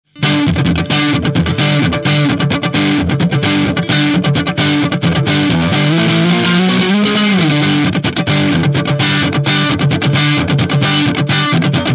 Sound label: funk; ampvergleich